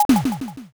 toaster

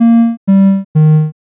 picosad

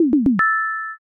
potion

